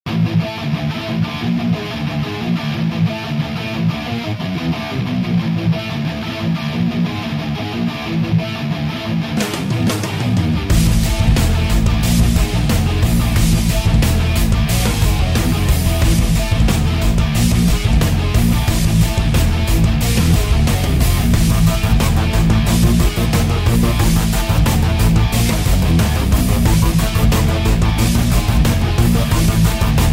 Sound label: music boss; iDaggers; Android